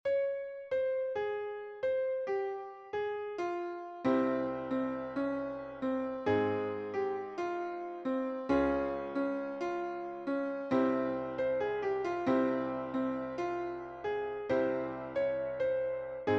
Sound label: Music